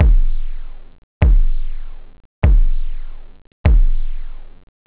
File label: hard, drums techno; OctaMED SoundStudio V1